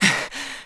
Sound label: Stamina Lowest; running with lowest level of stamina; Link; Skyward Sword; zelda